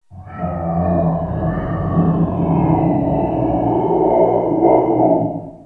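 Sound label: ambience zombie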